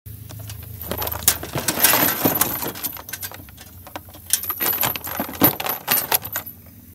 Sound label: shackles; غل و زنجیر